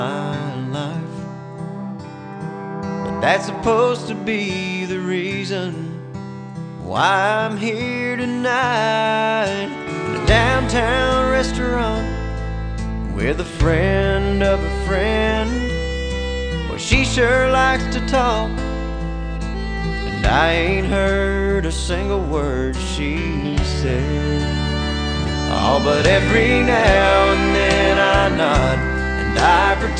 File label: country